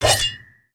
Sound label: spear, weapons; aronwk collection; ndaudio